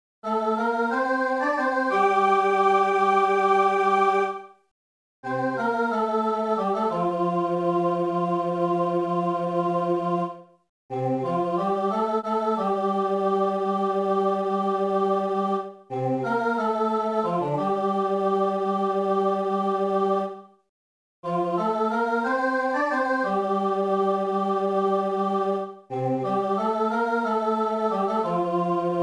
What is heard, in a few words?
music tutti; Si ca vous chante; mes 124 a la fin